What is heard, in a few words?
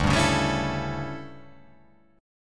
D Major 7th Suspended 2nd Suspended 4th D